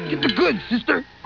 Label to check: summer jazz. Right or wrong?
Wrong